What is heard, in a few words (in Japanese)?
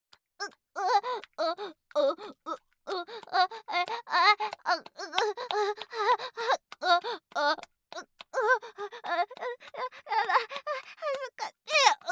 オホ声; SEあり